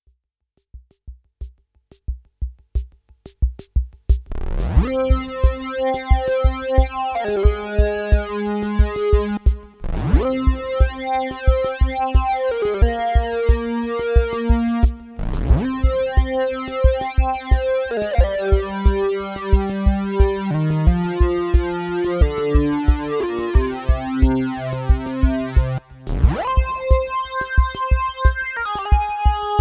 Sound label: Roland System8